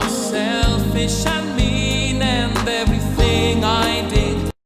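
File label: ICLD + ICC Coded, pop music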